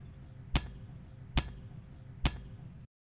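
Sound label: Ball Bouncing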